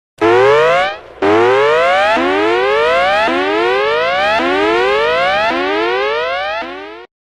Siren

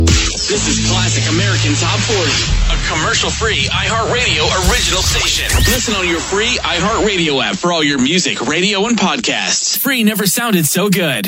Classic American Top 40 Intro

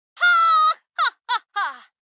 Opera Laughter

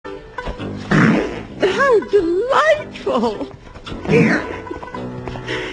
Fart How Delightful

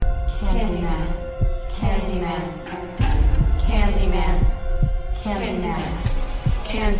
Candyman Chant

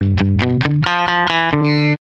Electric Guitar

